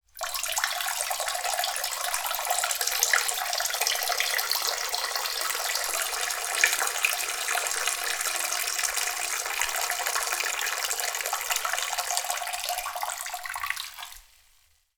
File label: Ringtone